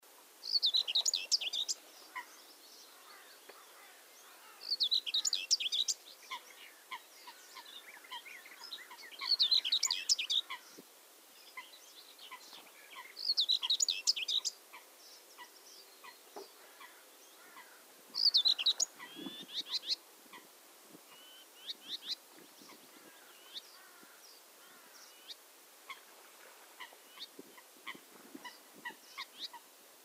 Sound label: Whitethroat Curruca communis